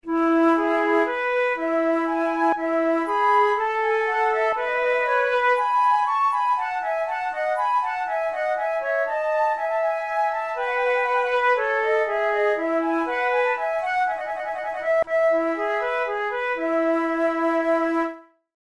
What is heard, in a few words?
prelude, flute